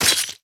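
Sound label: light attack hit; 轻攻击命中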